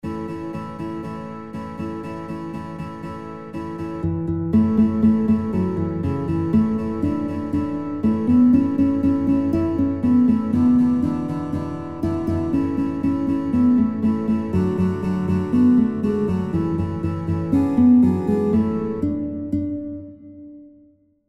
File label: Guitar; Guitar Songbook; Hab ne Tante Aus Marokko; kinderlieder gitarre playalongs